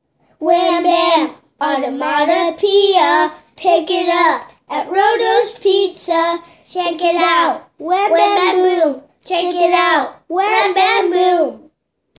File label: onomatopoeia jingle